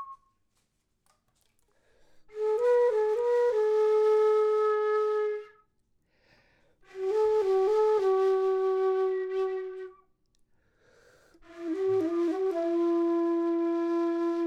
flute low; logic

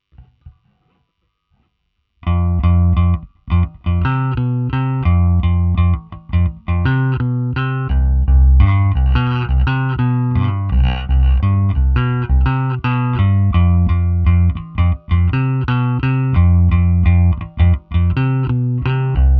bass

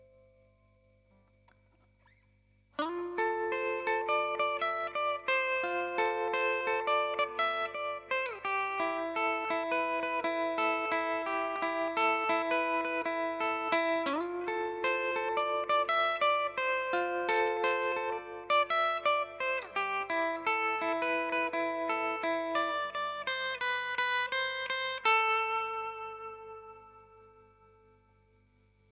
arpeggio; logic

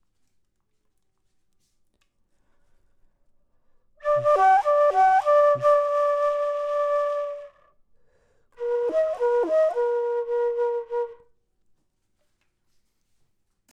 flute low; logic

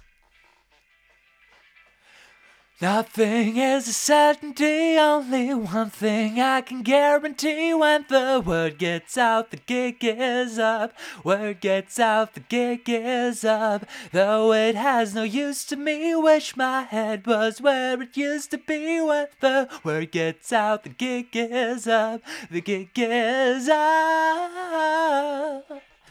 vox; logic

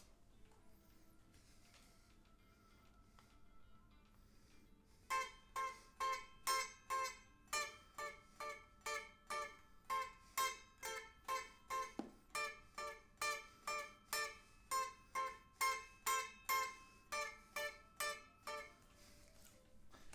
flute

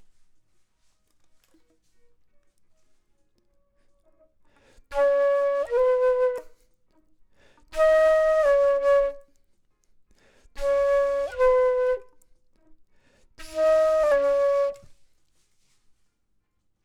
flute; logic

